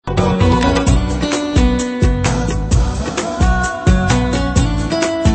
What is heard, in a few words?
melody tune ringtone